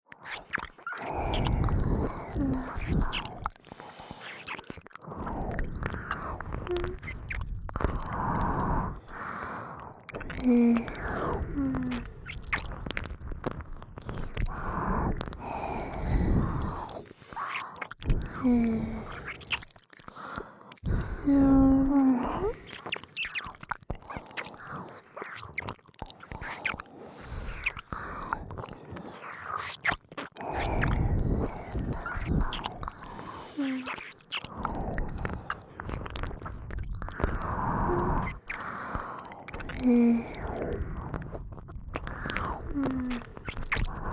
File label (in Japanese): ねっとり W 耳舐め, ASMR; 耳元ループ; おまけループ（オナサポ）